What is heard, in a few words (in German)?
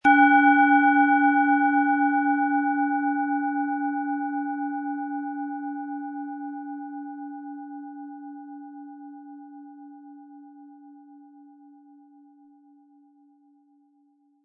Planetenschale